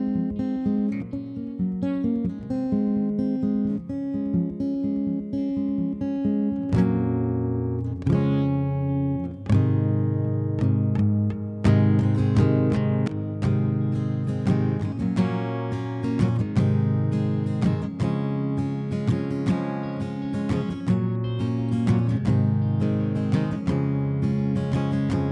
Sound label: Instrumental